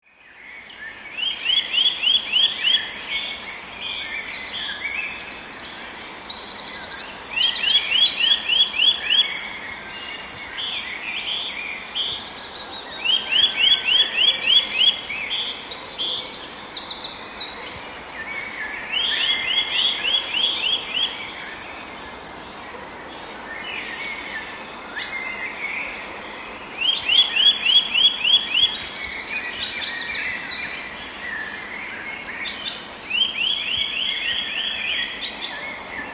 kowalik wolne tryle, wolne tryle, złożone z gwizdów wykonywanych w wolnym tempie; w sezonie godowym

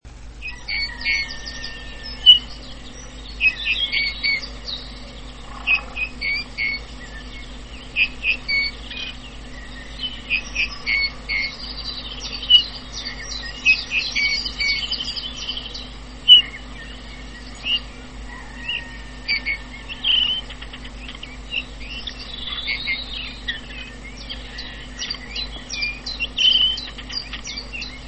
śpiew gila na tle innych ptaków

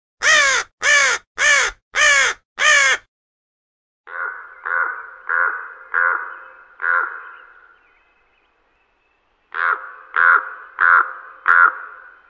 kruk, krukowate - corvidae; dialog kruków; posłuchaj moich własnych nagrań kruków

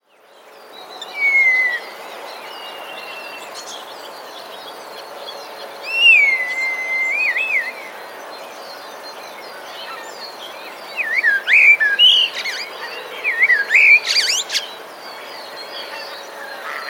szpaki, szpak do złudzenia naśladuje kosa. Oprócz kosa można też usłyszeć naśladownictwo sójki; w tle nagrania słychać okrzyki dzięcioła czarnego; wroblowe; zapozyczenia

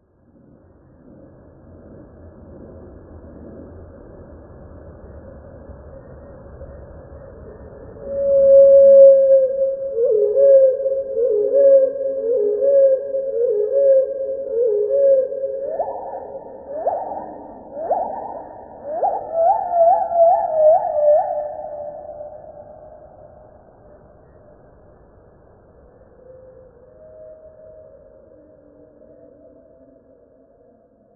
pleszka, nagranie pleszki; wroblowe; zapozyczenia

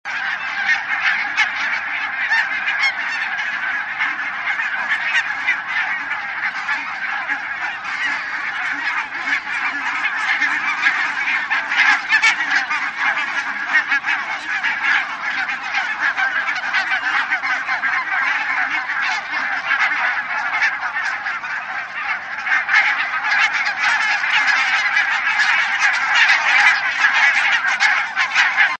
bernikle białolice; bernikla białolica

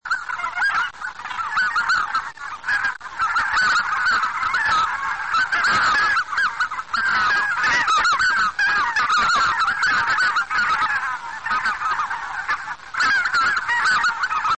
gęsi białoczelne

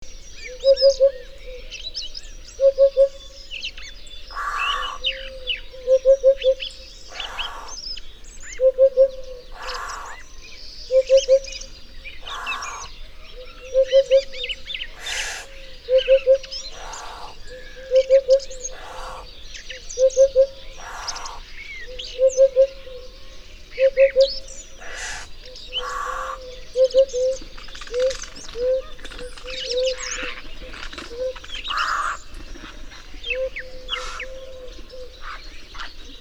dudek; Upupa epops; ptaki; szpak

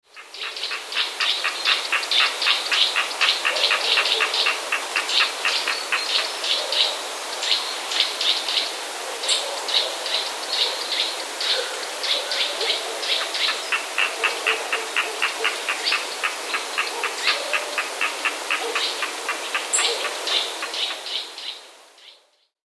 rzekotka

